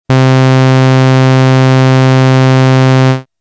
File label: Simplest Saw; synth patches Korg Prologue